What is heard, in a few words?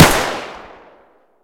pistol, Guns Fire